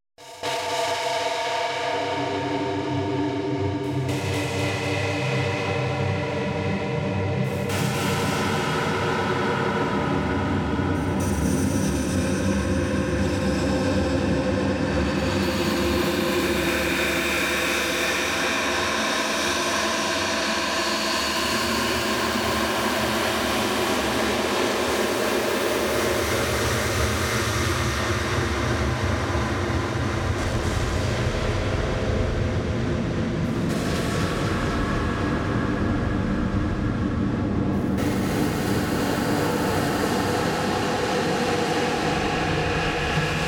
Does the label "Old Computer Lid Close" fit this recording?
No